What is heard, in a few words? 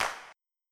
slap; MIDI Music File